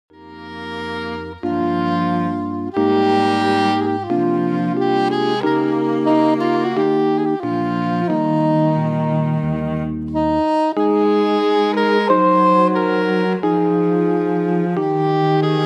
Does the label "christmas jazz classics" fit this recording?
Yes